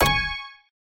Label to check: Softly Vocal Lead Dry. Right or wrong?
Wrong